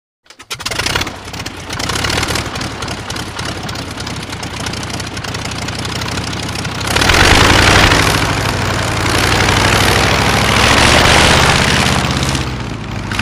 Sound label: Prop Plane; Start / Taxi; Old Prop Aircraft Attempting To Start, Finally Starts And Moves Away With Short Revs.